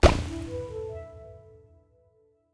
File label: Task Complete